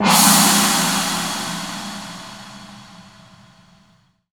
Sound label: Crash, impact samples